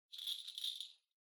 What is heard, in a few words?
Ring Bells Dry Noise